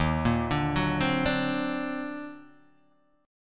D sharp Minor Major 13th D sharp, down, Guitar, Open D